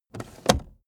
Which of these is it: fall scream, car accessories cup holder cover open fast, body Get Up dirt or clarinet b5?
car accessories cup holder cover open fast